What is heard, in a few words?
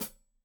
Hat Foot, Hi-Hat, Cymbals Stereo